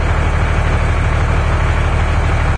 big truck, ambient machines; Source Engine; cstrike

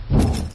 player duct; Source Engine; dod

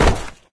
flesh impact hard, physics; Source Engine; video game

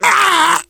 choke, survivor voice; source engine; left 4 dead 2; manager

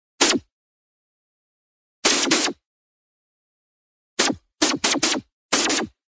sci fi sharp laser, single; cartoon

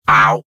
tiny bot take damage; source engine; tf; bot worker

